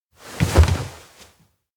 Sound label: peek; adult; aino; mitsu; anemoi